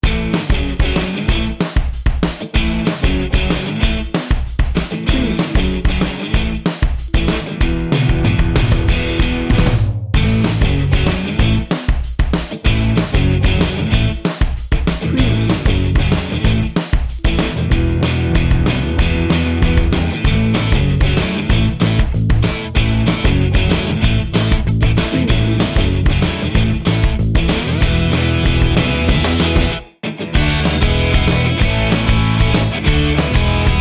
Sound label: upbeat indie rock bed; Upbeat and energetic, featuring a driving Indie-Rock groove with bright electric guitars.